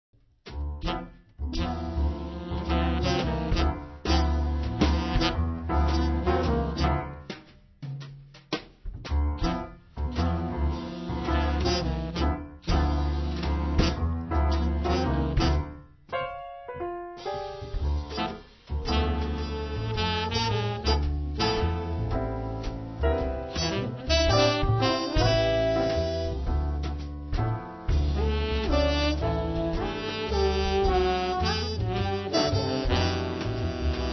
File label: Jazz